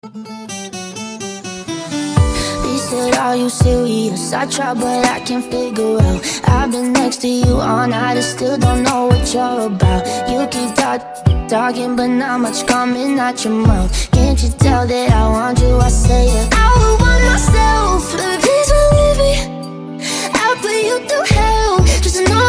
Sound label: Hip Hop